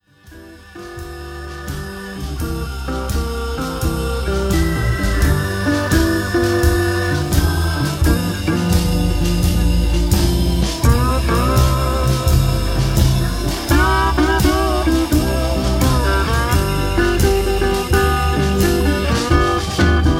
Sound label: Delta Blues in G